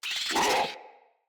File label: Infested Charger Alert